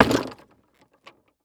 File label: Sports Bag Golf Bag With Clubs Hitting Cement